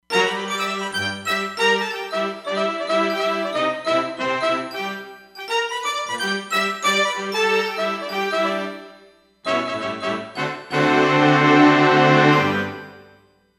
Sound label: Pop Orch, Roland Fantom XR